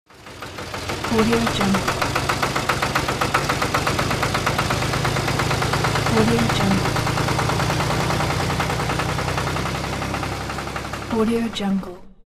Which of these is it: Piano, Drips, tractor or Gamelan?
tractor